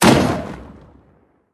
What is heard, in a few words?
War Wagon Death; Linirea